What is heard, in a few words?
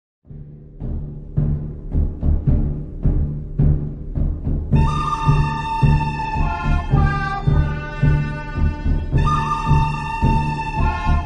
wild west instrumental ringtone; english ringtones